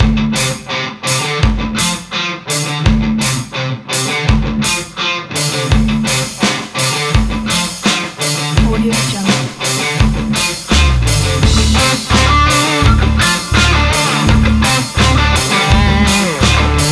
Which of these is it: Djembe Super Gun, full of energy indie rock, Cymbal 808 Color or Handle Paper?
full of energy indie rock